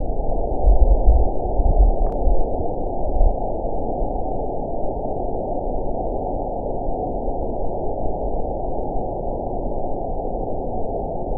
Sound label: Underwater